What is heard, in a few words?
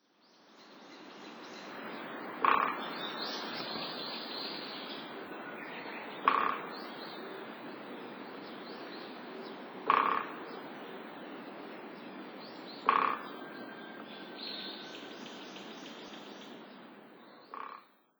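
Japanese Pygmy Woodpecker; koe; kogera; utsunomiya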